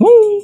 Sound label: "woo", voice; ooh ahh